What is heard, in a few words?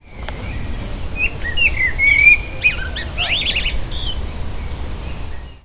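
Turdus merula, Amsterdam; HL Silveira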